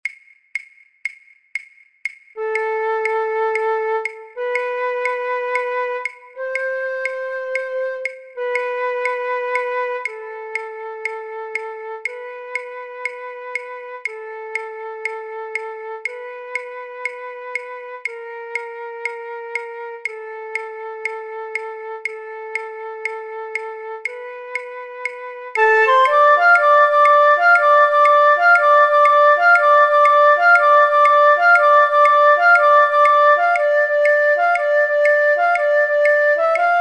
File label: flute violin 1; Regular speed; online orchestra